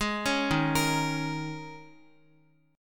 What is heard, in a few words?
Eb 7th Suspended 4th Eb, Guitar; x,x,6,6,3,5; 4E,4A,5D,5G,5C,6F; arpeggiated